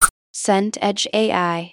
sniff

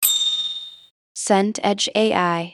bicycle bell

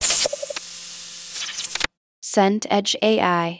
cyber glitch

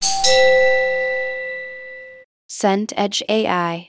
door bell chime

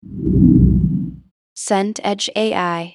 whoosh dark mid

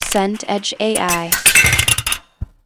shutter roller metal

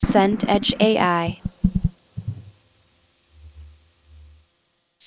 thunder rolling long

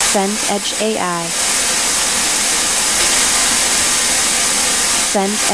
texture bit crush noise

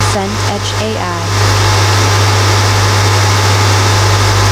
air vent

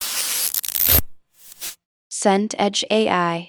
glitch tear

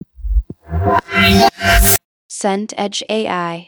riser micro

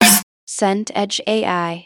snare dry

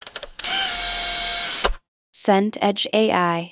phone rotary dial

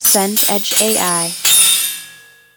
phone desk ring

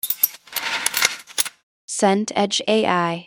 key in lock

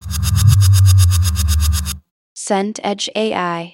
drone sub

